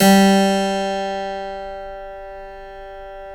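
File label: G 2 clavinet, Keyboards Acoustic; USB Soundscan vol.17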